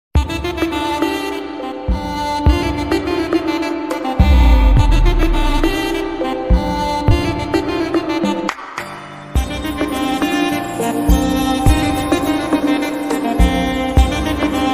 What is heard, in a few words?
bgm ringtone sad ringtone; skull ringtone